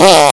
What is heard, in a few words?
airy fart louder